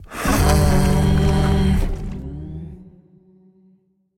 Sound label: thinking; smite; sylvanus